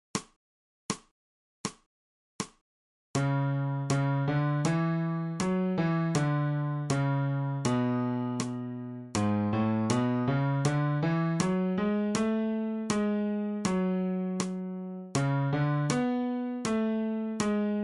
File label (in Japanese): 新フレットレス